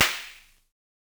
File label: Snare, Univox SR-95